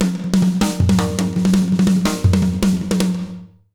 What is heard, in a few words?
160 B BEAT, Jazz Latin Drumloops; USB Soundscan vol.08; AKAI